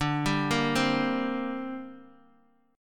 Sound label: D 7th Suspended 4th Sharp 5th down Bass Standard; D7sus4#5 arpeggiated